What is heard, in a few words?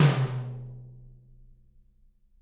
TT, Toms; Bob Clearmountain Drums I